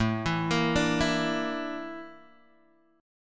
A sharp Major 7th Flat 5th A

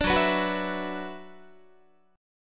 F sharp 7th Suspended 4th Sharp 5th, Guitar; F#7sus4#5 strummed

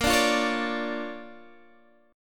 D Minor Major 7th Sharp 5th Bb, guitar

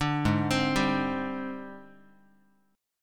G sharp Major 7th Flat 5th Guitar; G#M7b5 Chord

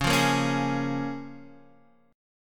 C sharp 6th C sharp down Guitar DADGAD

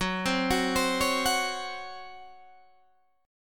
Db Major 7th Suspended 4th Gb

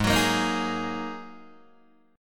Ab 7th Suspended 2nd, Guitar, Open D

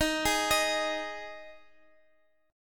Ab 5th Eb

